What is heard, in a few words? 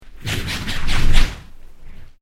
Created Creature Medium Gnash, Alien Sound Effects; Created creatures, vocalisations and pretend speech